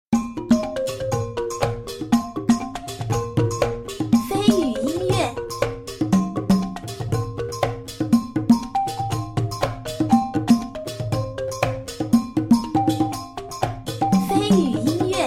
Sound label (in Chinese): 部落音乐